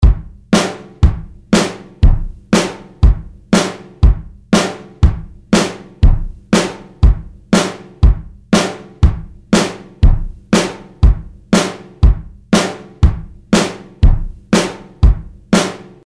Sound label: loop; daftfunk